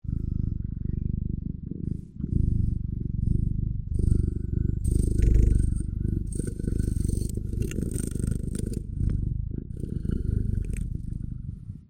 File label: cat purring